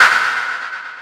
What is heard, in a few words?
step, sfx; anvil game